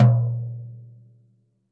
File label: floor tom - snares off; JazzFunkKit